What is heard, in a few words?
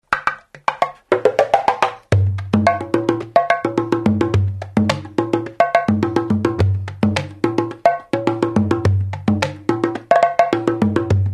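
groove, beats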